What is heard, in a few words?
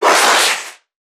Creatures Vocalisations Infected; soma; npc construct; Five Nights At Akers